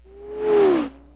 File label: fast car